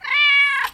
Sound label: meow